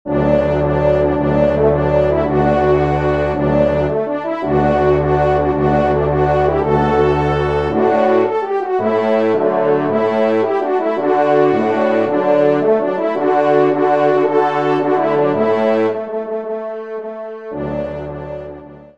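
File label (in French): Trompe Orgue; Sortie; messe cantin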